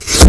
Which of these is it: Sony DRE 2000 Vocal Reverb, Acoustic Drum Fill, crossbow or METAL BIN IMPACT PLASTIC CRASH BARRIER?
crossbow